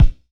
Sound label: Pound Clean Clear, Kicks; Airwindows